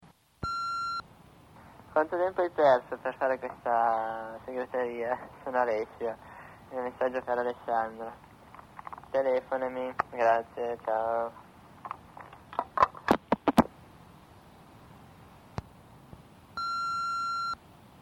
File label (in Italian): beat quanto tempo hai perso